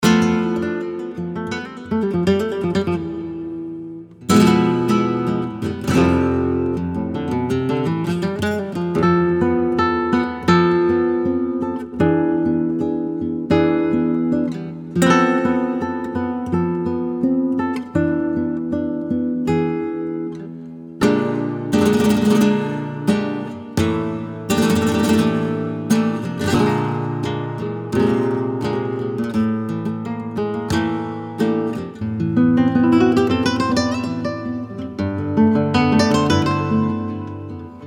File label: Classic Flamenco Guitars